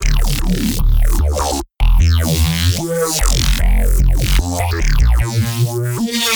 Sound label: Dubstep